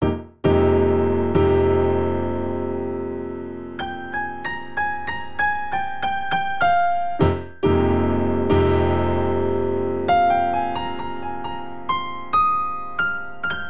Ostinato Expressive F Minor 11th 67 bpm, Grand Piano; MIDI Clips; Ableton